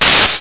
ZAP; HotLeather2

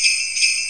cling

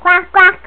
Quack; Old MacDonald

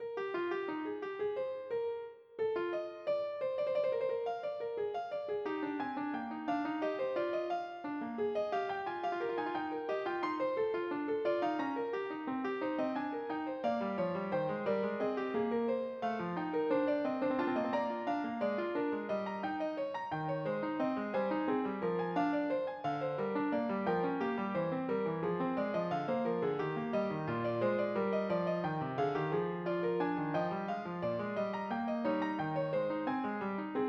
F # 7 in Eb Major, midifile; Bach Book 1

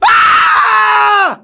SHRIEK; outlaws

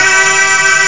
Chorus

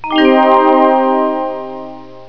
Fantasy Chord c; Troubled Souls

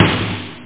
hard snare

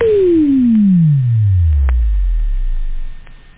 SHUT DOWN

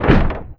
catapult fire; Alexander

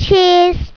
CAMCORDR

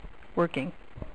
civilian work; Imperialism

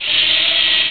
robo; vcop

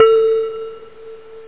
2 BELL; Rebound Arena

